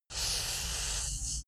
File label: paper cloth dragging